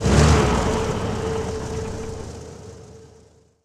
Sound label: t64a engine load, tanks; War Thunder